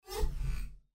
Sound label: spacedice swipe